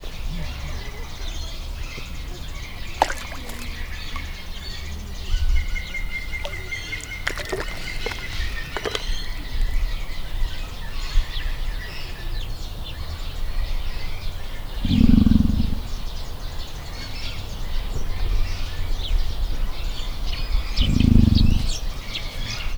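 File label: drinking then grumbling, emu; marantz puska; debreceni zoo 2012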